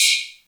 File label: high hat sample D key; drum single hits one shots; hat samples